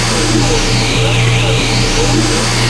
magical turbine; DAoC

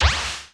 CLICKED

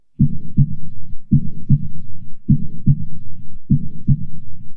jungle, ambience; QUAKE

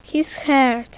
HURT; Chatka

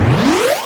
enemy crash

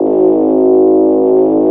analog

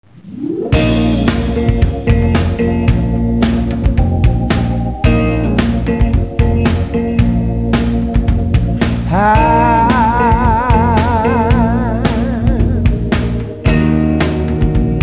MOAN

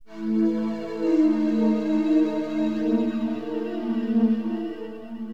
blow, keyboards; HipHop; LiveAct Pool 1

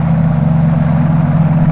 engine loop; Team Alligator

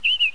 parrot minimize

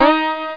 SITAR HI, STRINGS

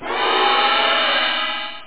Teleport; ampu1.01

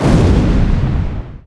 Boom; Falcon 40

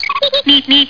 Testsound

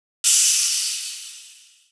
waste

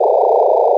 Tug; PC Gamer IT CD 48; Big bang

